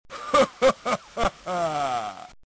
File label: laugh; pulseman